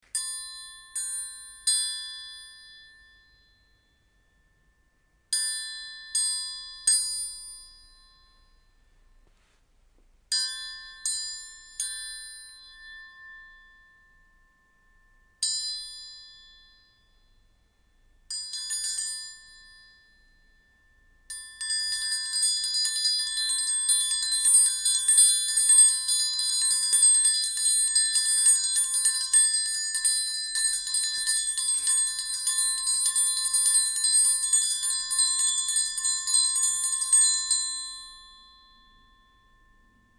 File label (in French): TRIANGLE; LA KERMESSE DU COLLÈGE